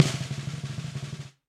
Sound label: Snare Press Classic Muffle; Snare Articulation; Drum Hits; Session Drums Studio; Standard Hits